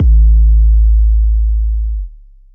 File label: boom kick; Booms; Command Boom Kick; Ableton; Factory Packs; Chop and Swing